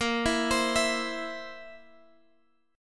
A sharp Suspended 2nd Flat 5th A sharp, Guitar Drop D